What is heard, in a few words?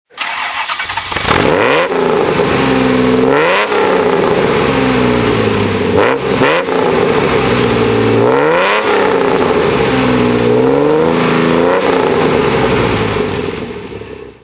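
jaguar e-type fhc throttle, engine sound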